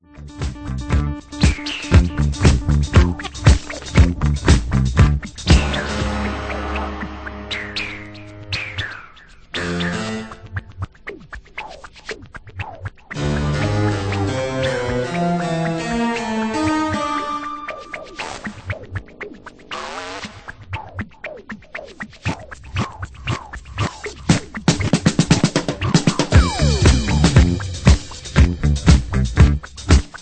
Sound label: drums of life